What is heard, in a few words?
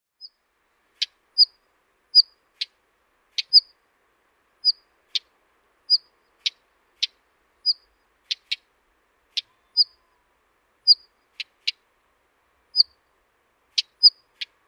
wheatear